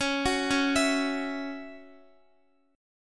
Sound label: Db Minor Double Flat 5th Db Guitar; arpeggiated; Dbmbb5; JGuitar